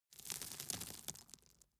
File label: gravel drop soft